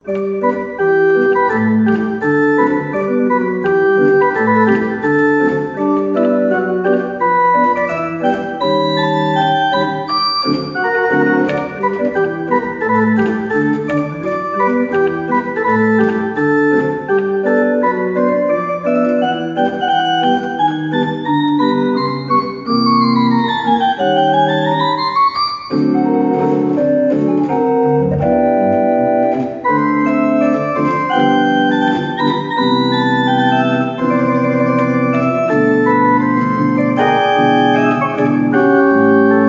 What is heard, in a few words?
Jazz-Pop